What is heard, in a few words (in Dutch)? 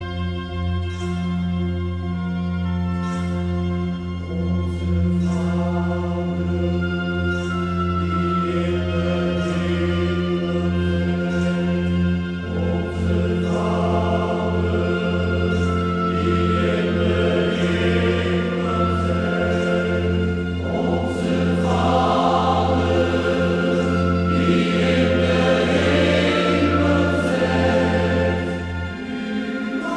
Koor (mannen); Urker